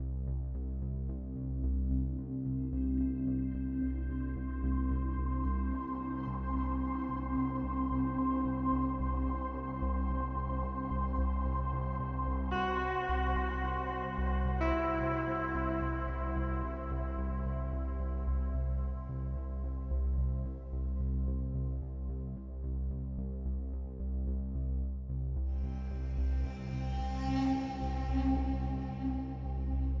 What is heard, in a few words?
space ambience pig bang; Angry Birds Space